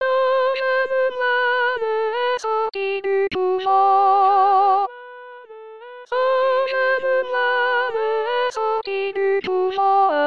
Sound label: soprano; jeune moine; 2019 st jean